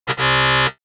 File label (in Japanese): クイズの正解・不正解